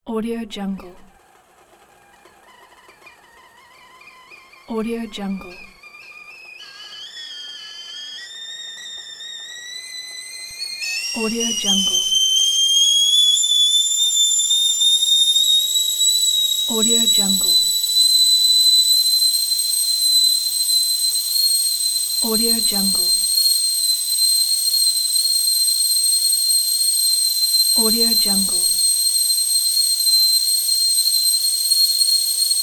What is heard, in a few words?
whistling kettle